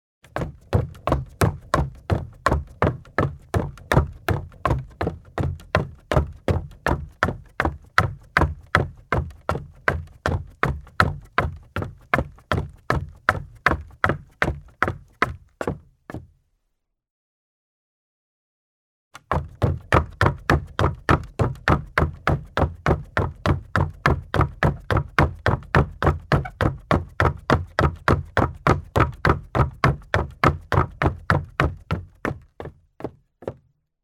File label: footstep; BBC 55 - Footsteps 1; BruitsDePas